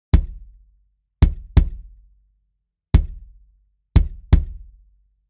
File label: kick drum 300hz